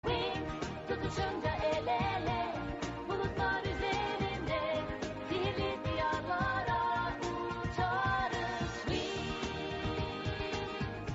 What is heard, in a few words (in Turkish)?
turkce sarkisi; winx club